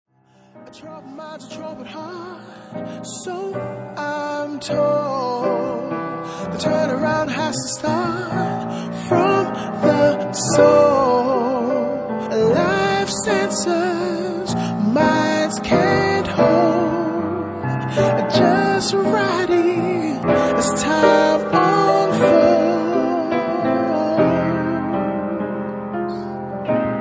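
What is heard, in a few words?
Piano Acoustic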